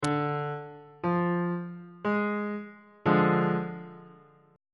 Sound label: c double sharp diminished chord on bass clef